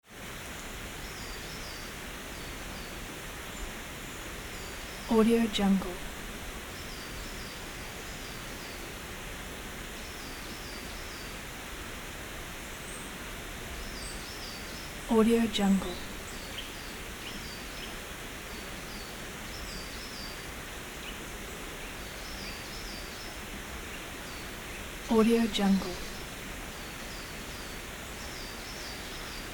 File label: wood ambience with birds